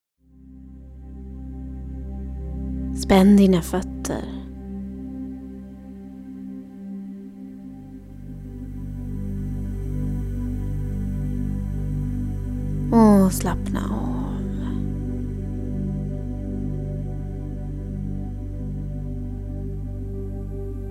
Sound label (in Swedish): binaural rytm och isokroniska toner; Progressiv muskelavslappning